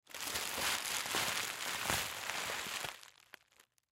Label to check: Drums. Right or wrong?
Wrong